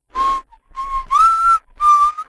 whistle

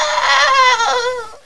minor fracture

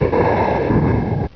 breathe

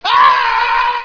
afraid shout

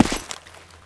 step; HL2 combine

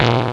alien switch off; buttons

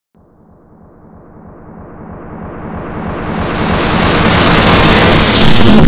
portal

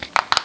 fist crack, weapons

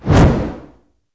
flare movement

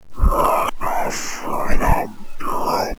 pyro alert

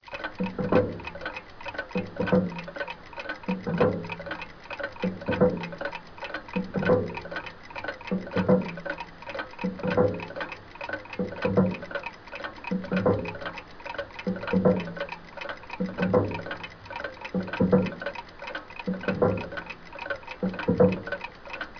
windmill

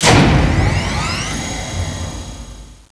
pipeline switch

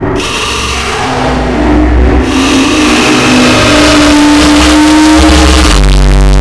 portal

